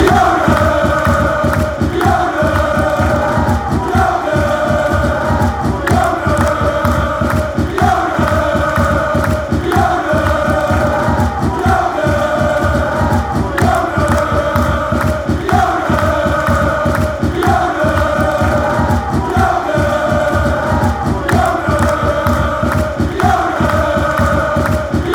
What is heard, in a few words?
ajax chant